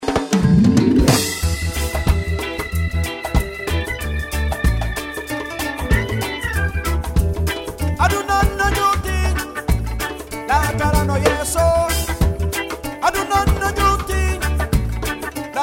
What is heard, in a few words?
Mandingo Reggae